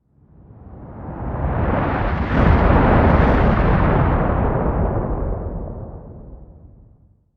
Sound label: Explosion Shockwave Pass By; Explosion Sound Effects; SFX Bible 6